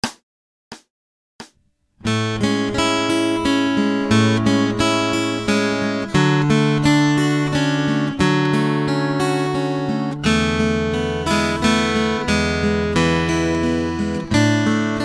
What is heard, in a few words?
Acoustic Accompaniment, acoustic guitar; Grade 1